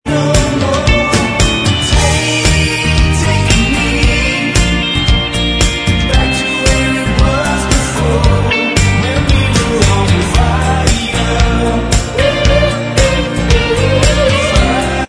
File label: Bvox Track, backing tracks